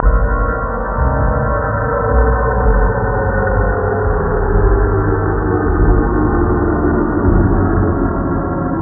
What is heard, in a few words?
sfx